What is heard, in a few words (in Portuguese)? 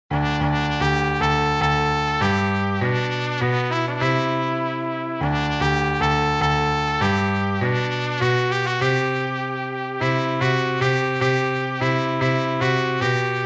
trompete e piano